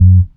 Elc Bass; Equipped Music

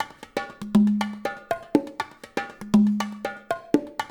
LOOP, Percussion; InVision Interactive

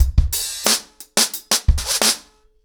90 BPM, acoustic drums, drumloops; The Roots Drumloops

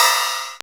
CYM CHEEZ, Cheez Cyms

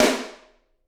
SD, Drums; Bob Clearmountain Drums I

